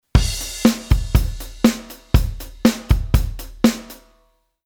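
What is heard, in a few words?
Midi Quantize 50 Percent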